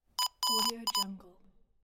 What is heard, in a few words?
morse character